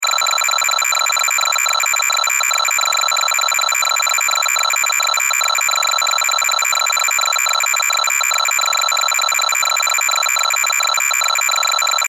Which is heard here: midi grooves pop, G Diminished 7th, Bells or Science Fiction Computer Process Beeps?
Science Fiction Computer Process Beeps